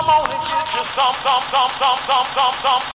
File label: super compress stutter loop, MALE SINGING; VOCALS and SPOKEN WORD